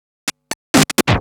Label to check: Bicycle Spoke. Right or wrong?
Wrong